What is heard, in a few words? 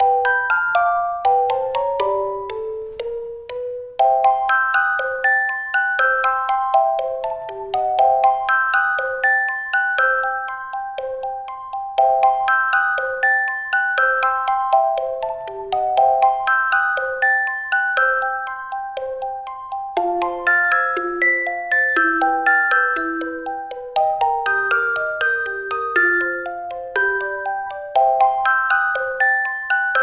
Underwater (Hurry Up!); super mario maker 2; SMB3 Underwater - Moon